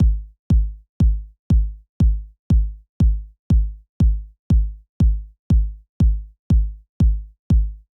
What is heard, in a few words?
Kick